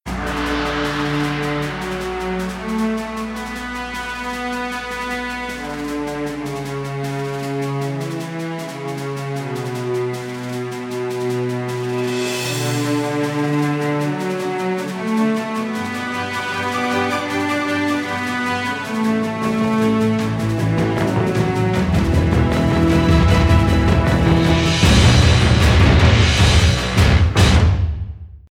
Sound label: ringtone